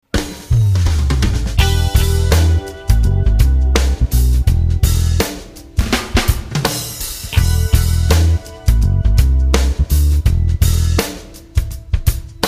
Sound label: transition